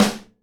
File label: SNARE DAMP, Live Snares; PlatinumPhattCD1; E-MU Producer Series Vol. 8 – Platinum Phatt